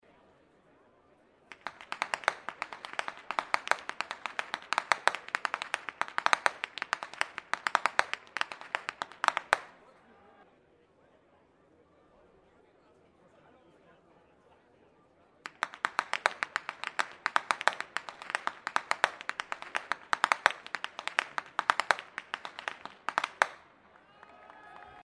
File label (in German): schnalzen, jugend; waging